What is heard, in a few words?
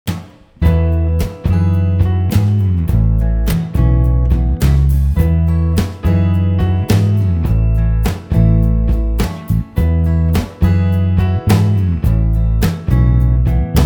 instrumental